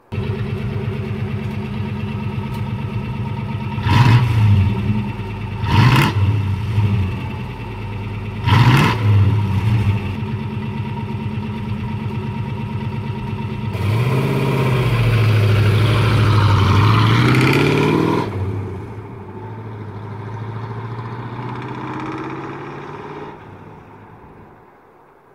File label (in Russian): gaz24 v8 uezjaet; gaz 24 volga; Звук запуска Волги, шум работы двигателя; Звук запуска Волги, шум работы двигателя для монтажа видео